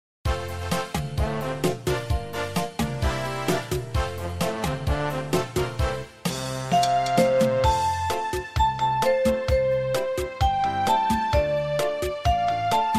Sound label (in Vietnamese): nhac beat